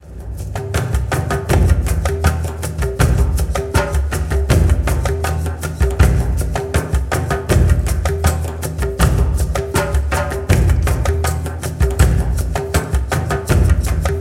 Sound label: relax cargo drum; powermusic